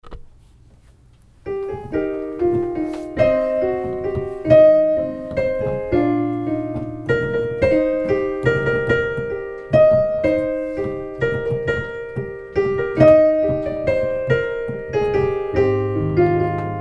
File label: piano